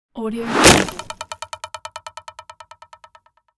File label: arrow impact wood